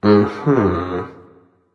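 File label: non verbal agree, voice; cernunnos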